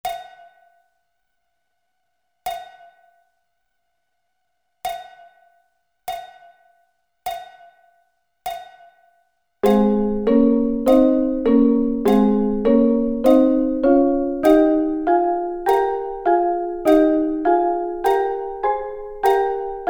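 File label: A flat Double Stops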